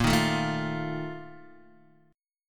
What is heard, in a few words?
Bb Major 7th Suspended 4th, Guitar, DADGAD; x,1,1,2,0,3